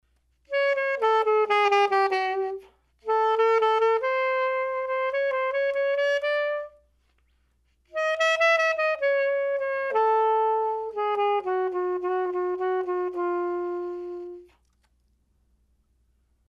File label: quarter tones